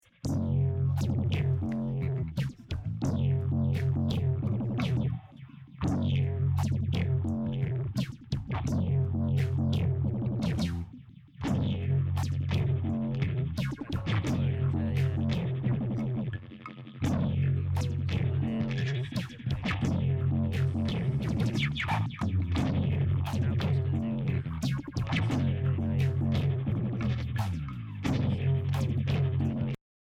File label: Filter Freak Cranking on Mix